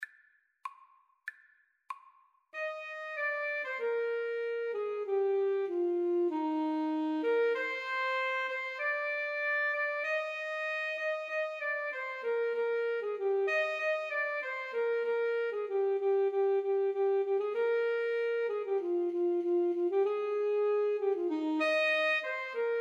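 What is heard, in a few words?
Alto Saxophone Duet, karaoke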